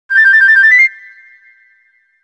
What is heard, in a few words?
Collect Red Feather; Banjo-Tooie